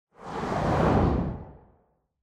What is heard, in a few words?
Camera Motion; シーン・カメラモーション